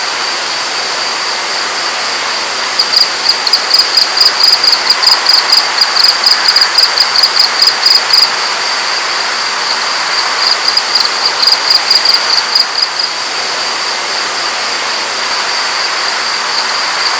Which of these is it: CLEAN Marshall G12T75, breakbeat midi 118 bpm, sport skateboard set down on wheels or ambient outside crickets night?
ambient outside crickets night